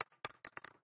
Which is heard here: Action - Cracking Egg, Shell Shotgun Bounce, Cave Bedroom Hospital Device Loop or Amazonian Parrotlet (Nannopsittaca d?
Shell Shotgun Bounce